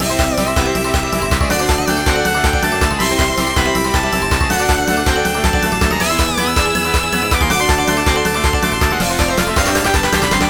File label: Bgm bonus; ovenbreak